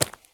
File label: run slate; Escape from Tarkov